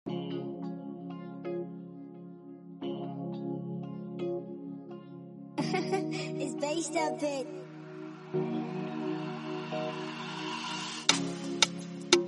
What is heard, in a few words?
Afrobeat Instrumental